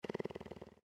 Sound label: Panther Purr; Animal